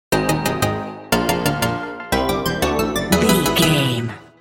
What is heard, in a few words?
stage complete video game stinger